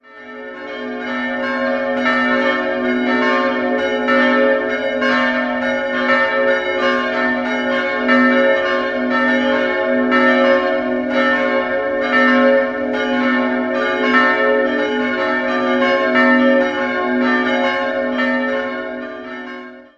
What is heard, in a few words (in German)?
glocken; Eckersmühlen ev